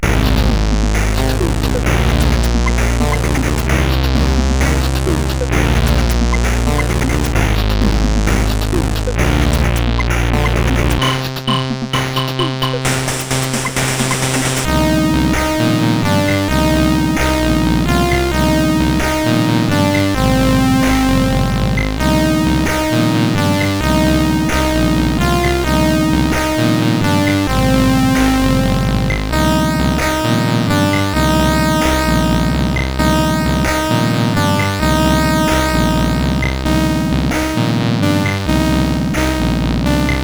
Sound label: sid, CSG8580R5; Bozuma